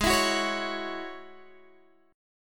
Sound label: Ab 7th Suspended 4th Sharp 5th Ab down Guitar Open G